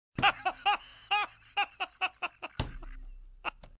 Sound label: laugh